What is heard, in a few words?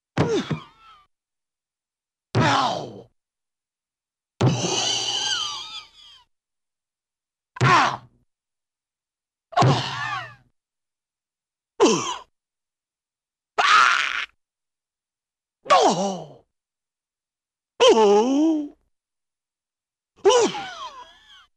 Various Grunts, Cartoon; Hollywood Edge; Cartoon Trax